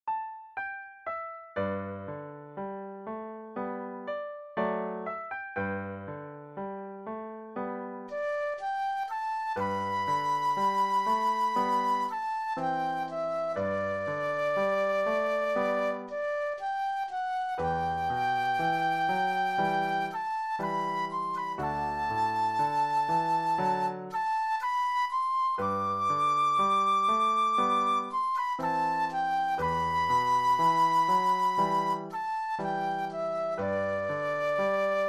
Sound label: flute solo